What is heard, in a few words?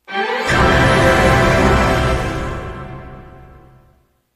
Achievement Fanfare; ssbm